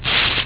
TEAR, PAPER

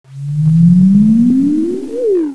OUUUUUGH

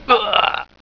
grunt; Gloom3 rejects

